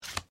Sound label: flip